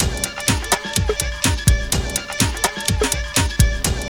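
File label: drum loop; X-Static Goldmine 3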